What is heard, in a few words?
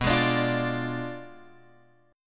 B Diminished 7th, down, Guitar, Open F; Bdim7 strummed; JGuitar